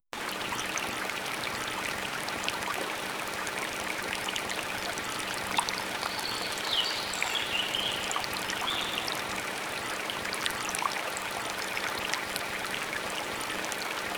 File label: calming forest river with, water